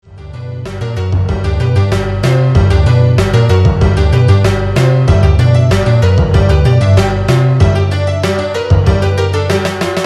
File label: Honky Tonk Dumb Drum; Prehistorik